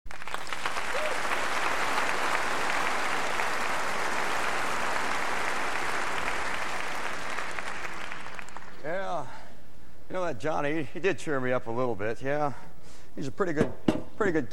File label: Intro We Wish You Merry Christmas Brass; 1989 christmas show